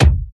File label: bright kick single shot c key, steel kick drum sample tuned to the C note